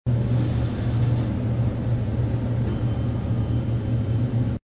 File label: lift